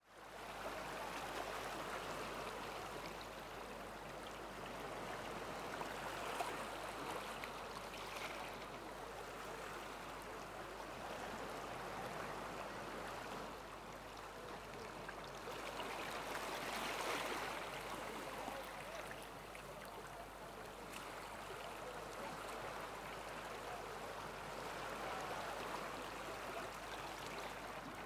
Sea, Seashore, Water Stream Gurgling On Rock, Crispy Close Perspective, Medium Waves Roll; Far Muffled Voices; Surround; Sonik Sound Library; Spatial Shores